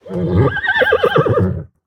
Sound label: whinny, horses